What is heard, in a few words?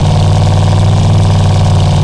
v8 diesel medium, engines; acf engines